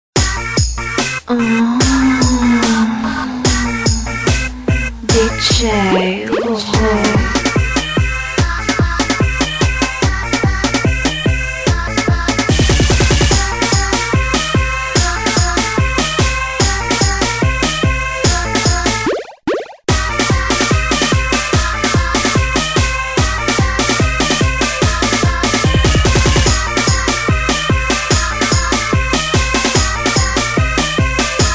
instru

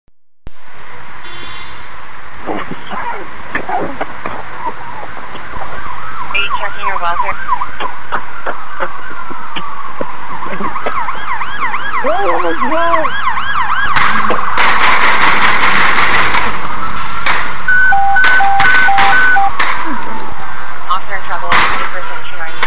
son radio alerte

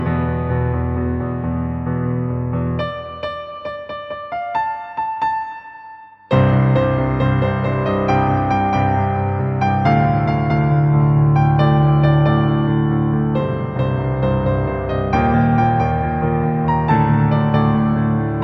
accord

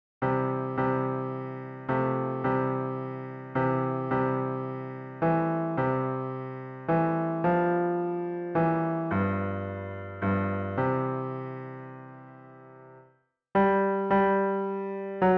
Basse; Montagnes Valdôtaines

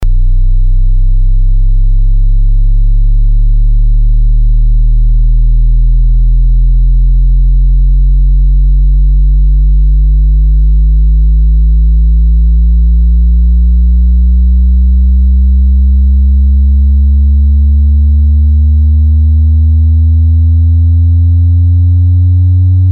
Tone basses bruit; casse couilles

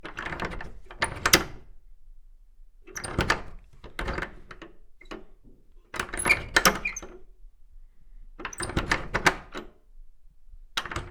BRUITAGE PORTE

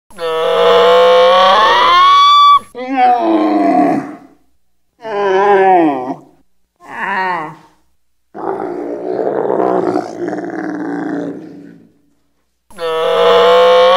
CHAMEAU qui BLATÈRE Cri du chameau Camel sounds; Verso del cammello

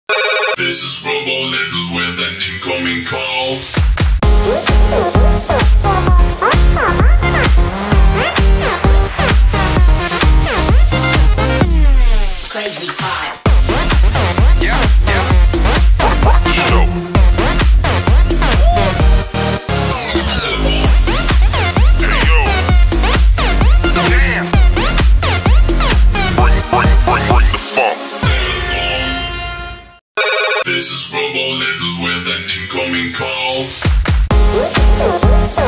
Musique Fond

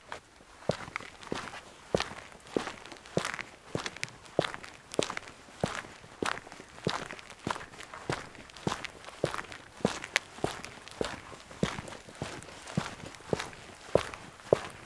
Pas rapides sur beton

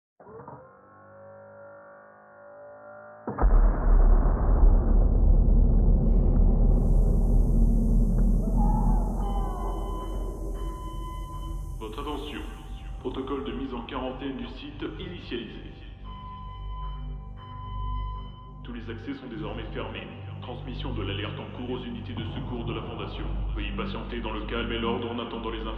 Alarme; SCP; Brèche de SCP-008

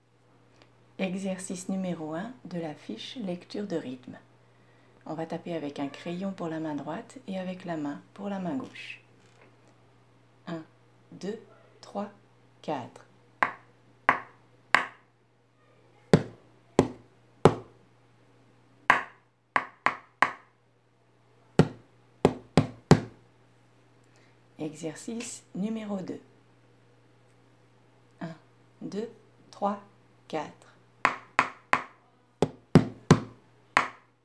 lecture de rythme

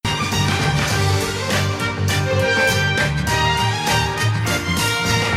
accords

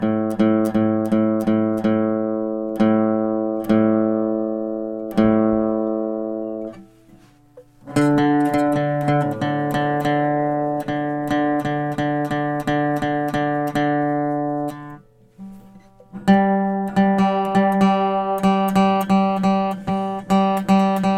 accordage guitare

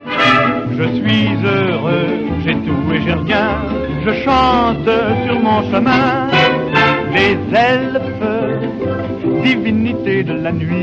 Je chante

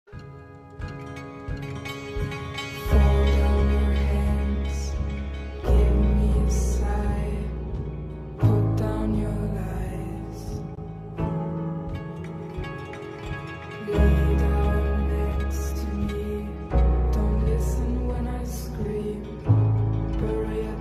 générique; dark

